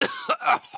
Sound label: AHurt